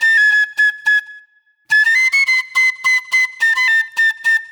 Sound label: Eastern Flute; Sonic Foundry (Sony Creative Software); Manhattan Flutes; Garage Funk